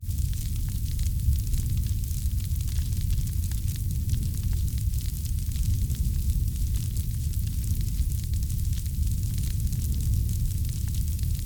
Fire burn Burning Campfire Crackling Squawking Flame Roar